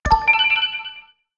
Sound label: Wave, notifications; Coolpad; Note-8